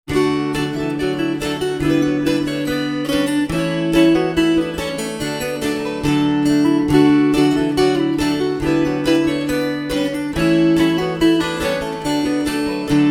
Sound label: Eighth January fast